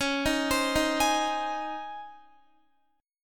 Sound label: Db Major 7th Suspended 2nd Db Guitar; 4F,4A,5C,5G,5C,6E; arpeggiated; chord shape